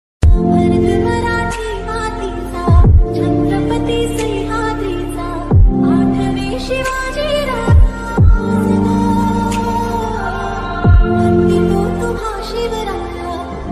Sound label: DJ ringtone; chatrapati shivaji maharaj